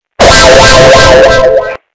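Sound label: rizz earrape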